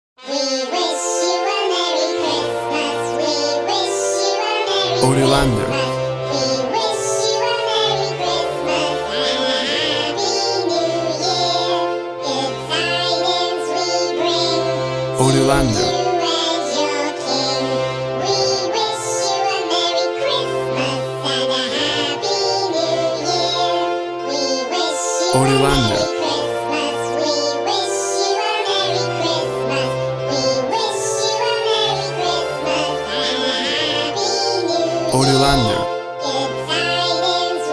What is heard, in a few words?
We Wish You A Merry Christmas Chipmunk Elf Minion Style